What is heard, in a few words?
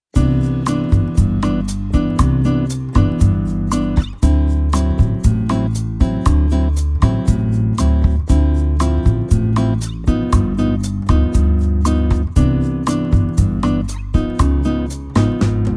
guitar